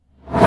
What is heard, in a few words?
Riser